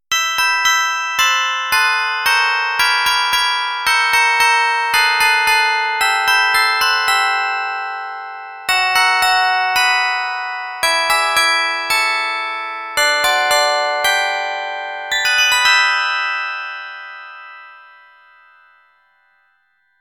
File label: Celeste, carillon